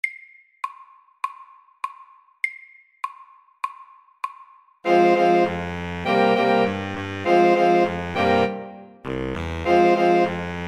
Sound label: Jam SLOW 100